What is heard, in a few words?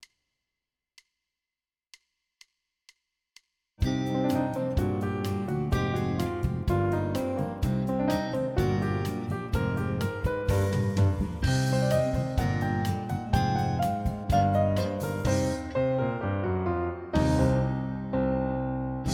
Persistent piano Acc